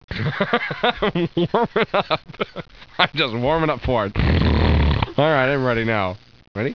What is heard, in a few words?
LAUGH